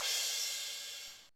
Crash, mxr; classic machines

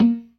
PERC, ORGAN; Keith Emerson Signature Series

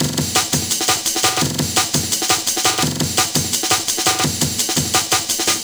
Kickin Break, Drum & Bass, Beats & Breaks

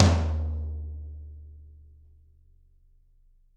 Toms, Drums; Bob Clearmountain Drums I

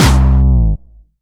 Distortion Kicks, Bassdrums

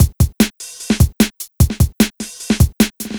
Drum Loops, 150; CleanHouse; M8; Electronisounds

